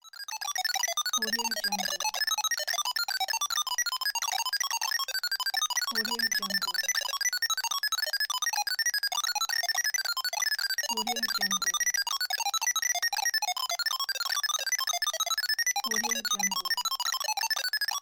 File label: coding